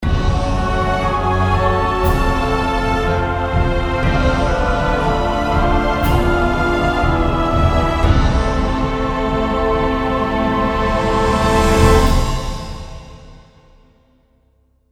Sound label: lose; dungeon hunter 2